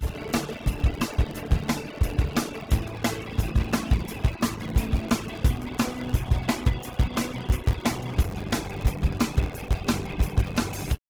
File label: groove soft